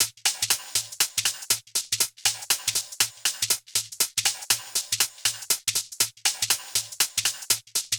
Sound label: Hh Sh Loop 120; Equipped Music; Loungin House